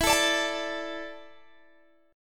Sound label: E 7th Suspended 4th, Guitar, Open G